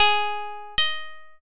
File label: sitar